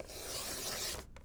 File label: Cut Paper, paper cutter; Micro Pack